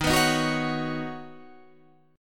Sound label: D sharp Minor 7th Sharp 5th D sharp, down, Guitar, Standard; D#m7#5 strummed